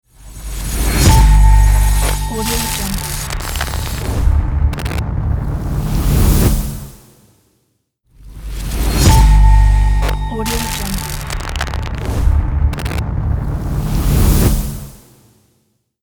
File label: high tech glitch logo